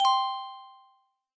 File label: increment stop; Wild Ape